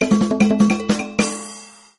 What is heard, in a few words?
gameover; tenaPuyo